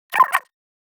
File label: Player Idle